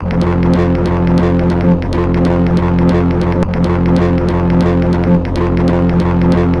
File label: Pad Roar; Shinechilla; F6